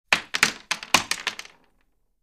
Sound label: Multiple Shells Ejec